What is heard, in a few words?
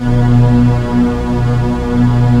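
E2, full orchestra; East West; Global Sounds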